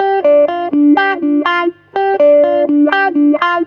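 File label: guitar, Roots Disco; USB Soundscan vol.32; AKAI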